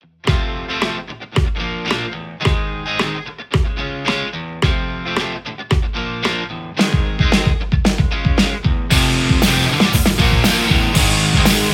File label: strat; tse x30